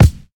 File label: thick bass drum single shot c sharp key, Royality free kick drum tuned to the C# note